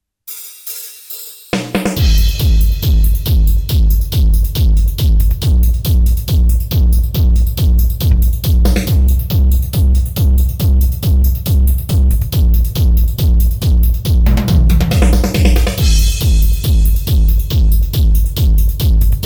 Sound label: train Drum